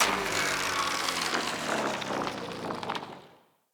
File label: Bird Repulsor Destroy Chunk Energy Fizzle; Objects; Thawtide